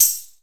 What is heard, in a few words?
TAMB; MPC